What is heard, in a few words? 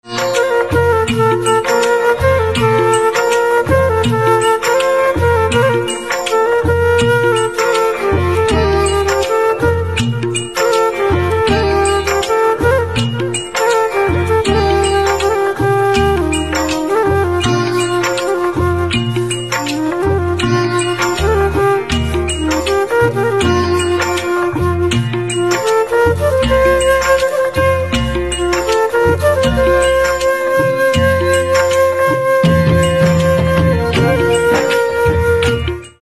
Flute Instrumental Ringtone